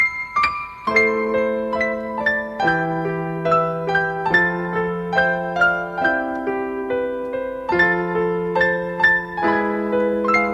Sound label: soft romantic tone